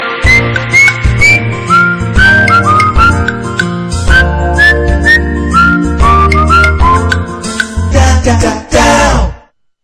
Tone; Videocon; TV ADS Tone

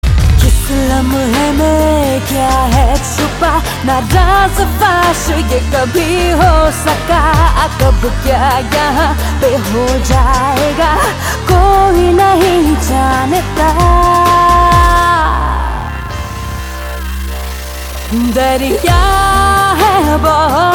Female; Bollywood; John Day

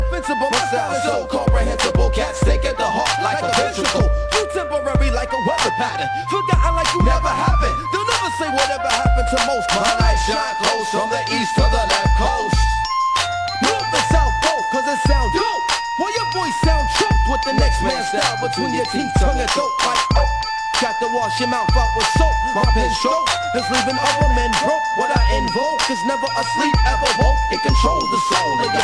Christmas Hip Hop; Christmas Special

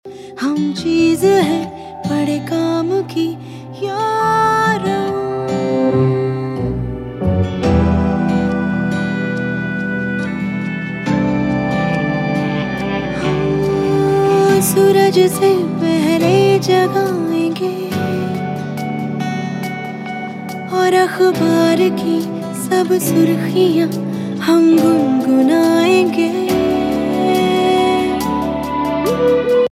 Female; Bollywood; Ek Thi Daayan

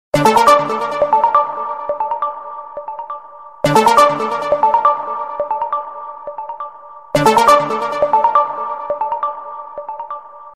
Sms Alert; SMS Tone

